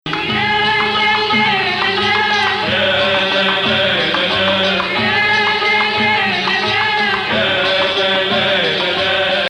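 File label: Sikah Bayati, Arabic music; Jadaka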